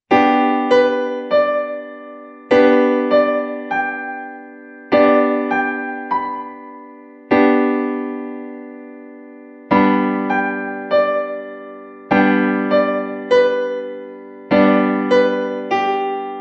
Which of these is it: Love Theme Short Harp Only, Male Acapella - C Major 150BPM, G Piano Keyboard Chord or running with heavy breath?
G Piano Keyboard Chord